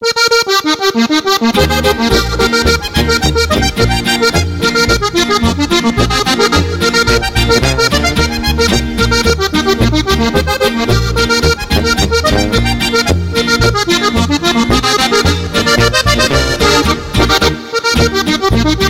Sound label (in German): Boarischer